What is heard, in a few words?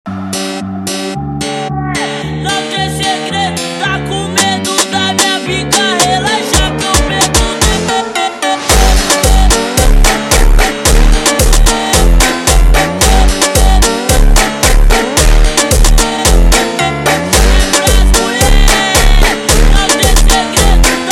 Funk super Slowed, funk